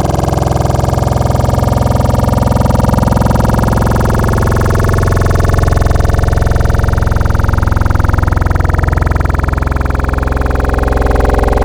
Growl Tables; Bend It; Cymatics; SERUM; Growl Tables Vol 2

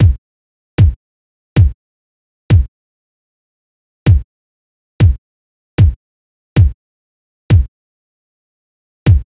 Kick, Drum Tracks, Amin; Hip Hop; big fish audio; Hip Hop Exotica; Apple Loops

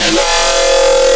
Detune It; Cymatics; Growl Tables Vol 2; Serum

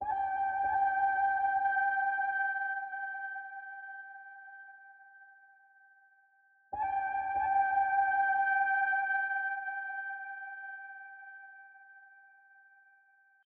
143 BPM G Min Flute; Cymatics; Rise Up; Destiny Gold Expansion

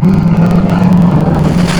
Polar Bear, Wavetables; Cymatics; Serum; Animals for Serum - Gold Edition

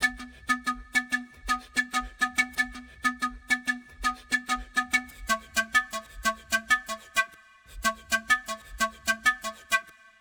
Pan Flute, 094 Bmin; Apple Loops; Big Fish Audio

